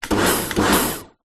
industrial pressure washer kartcher k2 2x quick spray bursts motor end; zapsplat